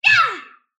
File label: sylph attack short; dota; dark willow